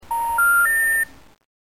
error beeps

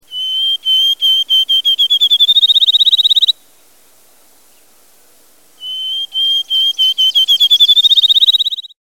Field sparrow

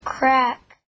CRACK

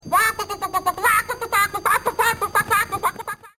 TW chick

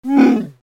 grunt

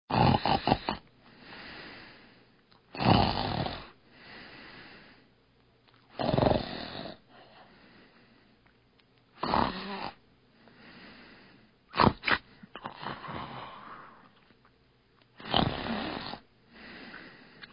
Snoring

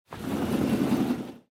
Slide

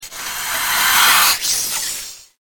snake hiss

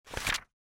page flip

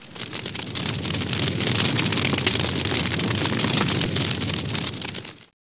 FIRE

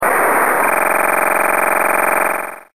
scram dig

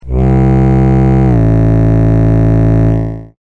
foghorn

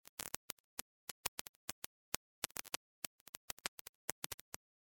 clicks

